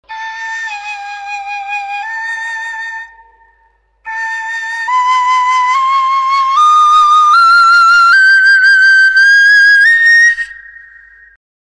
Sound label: Bronze Flute